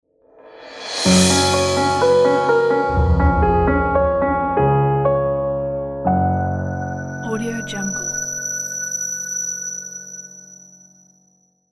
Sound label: elegant piano logo